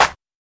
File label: 2000s steel snare drum sound g key, snare drum sound tuned to the G note; snare drum samples